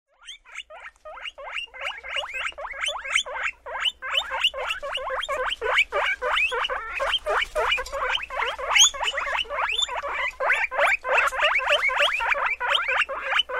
hausmeerschweinchen; haus und nutztiere erkennen

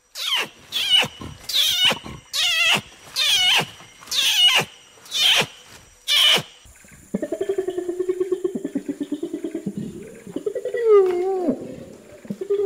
orang-utan; zootiere erkennen

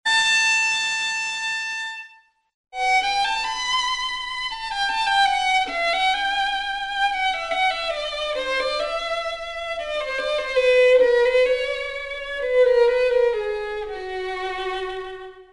geige

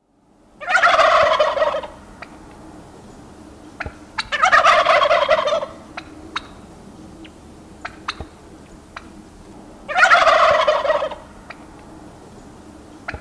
truthahn; haus und nutztiere erkennen

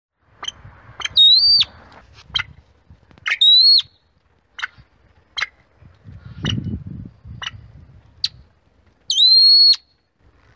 goldfasan; haus und nutztiere erkennen

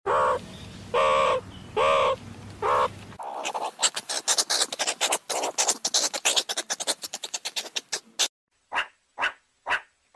erdmnnchen; zootiere erkennen

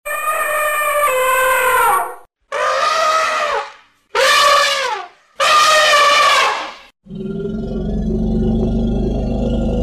elefant; haus und nutztiere erkennen

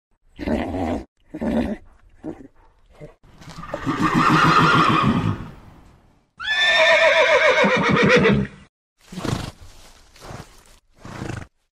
pferd; haus und nutztiere erkennen

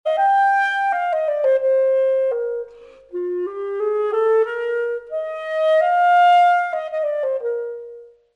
klarinette

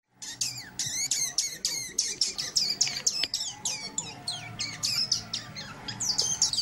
degu; haus und nutztiere erkennen

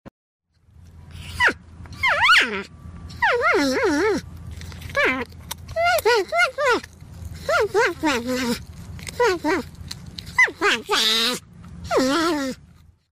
stachelschwein; zootiere erkennen

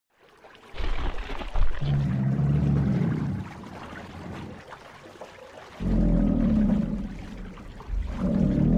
krokodil; zootiere erkennen

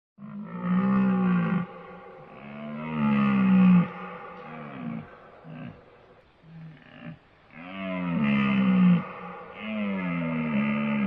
rentier; haus und nutztiere erkennen

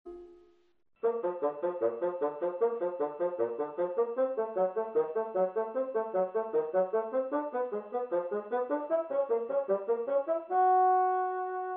fagott

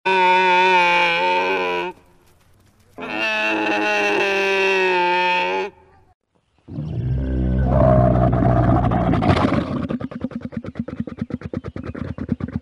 kamel; haus und nutztiere erkennen

